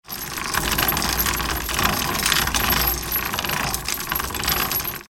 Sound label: Metallic chain pulling rattling